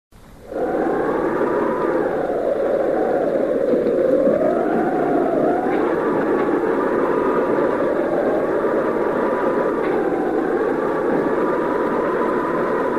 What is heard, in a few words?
Thunder Storm; Halloween; Psycho